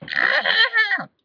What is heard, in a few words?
angry rrr